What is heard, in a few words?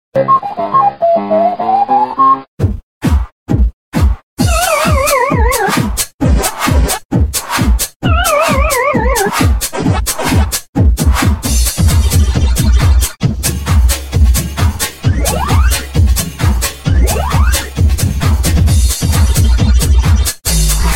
Techno; Pacman